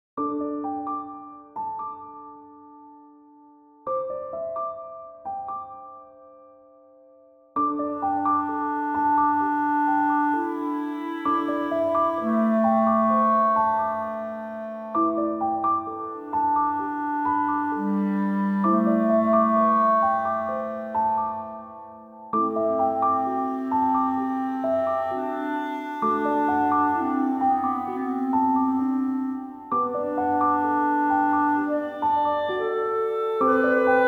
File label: clarinet in B flat and piano